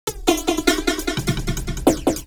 drloop, drumloops, Vinyl Attack; Masterbits

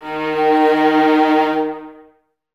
String Ensembles, Violas; D#; Miroslav Vitous; LINKS ORCH

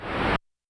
Reverse Noise, YAMAHA RM1X

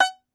Tenor Banjo, Plucked Strings

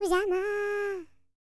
HIMALAYAN, World Voices; ILIO; Vocal Planet VOL-5

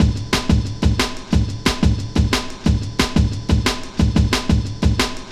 Funk Break, Drum & Bass; Fluid Dynamics

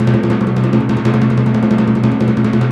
Timp Roll, Orchestral Perc; Roland LCDP03; Timp Rol Dry

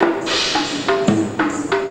PERC, India

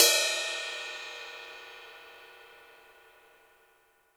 Gretsch Ride Bell Hit; Sonic Foundry (Sony Creative Software); Vital Drums

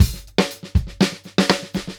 dry 120, Beat Loops; Zero-G; Monster Beats

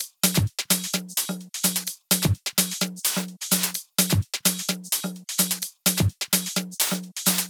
Drum Loop 128BPM Percussion; VEC5 Drumloops; VEC5 Multi Loops; Loop Elements

